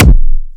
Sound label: Angry Pulse Kick